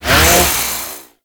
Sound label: Ghoul Sawman Melee Swing; Warframe; Grineer Ghouls